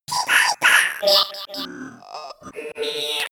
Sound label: vocal glitch